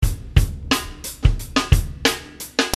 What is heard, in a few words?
Dream Hop